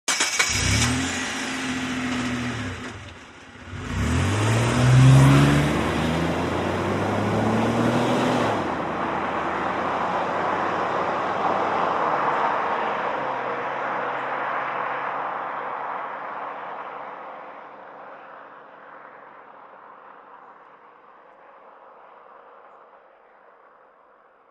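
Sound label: Montero Start Fast Away, 1998 mitsubishi montero ext.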